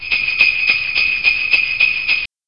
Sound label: jingle bells